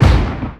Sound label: mass driver fire, weapons; Stellaris